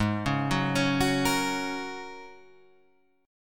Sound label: Ab 7th Suspended 2nd Suspended 4th, Guitar, Standard; Ab7sus2sus4 arpeggiated; 4,4,4,6,7,6